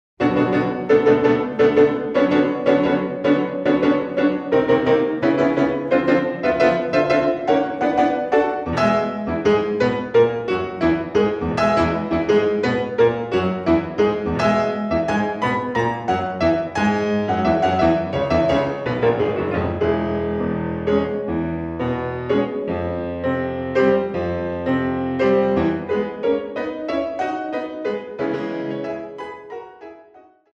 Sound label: Baioa